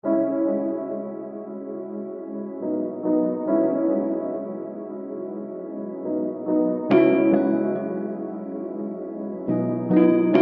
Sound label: MIDI